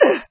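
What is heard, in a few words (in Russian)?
кашель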